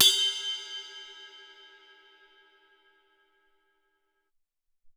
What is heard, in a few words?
Amb Bell, Alesis SR-18